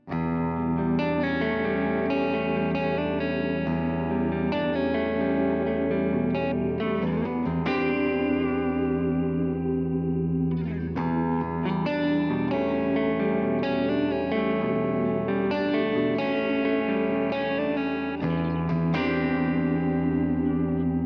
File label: Reverb Chorale